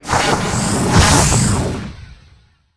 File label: guardian attack, monster